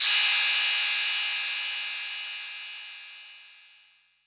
crash cymbal f sharp key, Royality free crash cymbal one shot tuned to the F# note